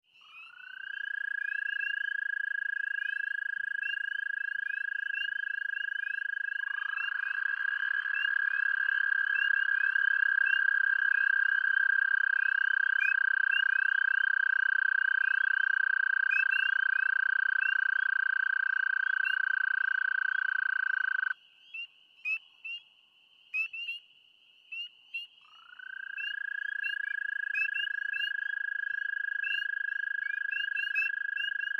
frog calls mixed chorus two american toads surrounded by spring peepers